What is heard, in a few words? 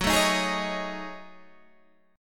Gb Minor 6th Add 9th Gb down Guitar Open G